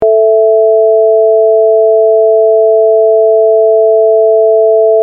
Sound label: sine test